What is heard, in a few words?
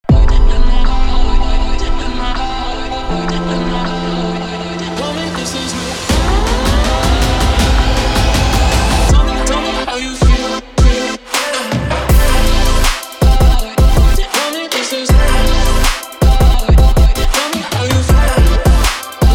future bass trap; FASSounds